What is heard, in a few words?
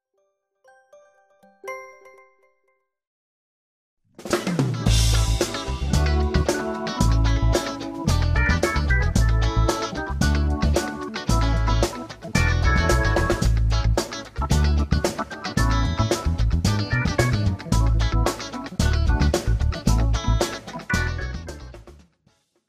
samsung galaxy a7 ringtone